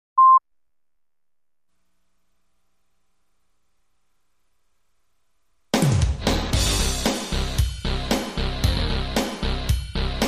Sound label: Drums & Bass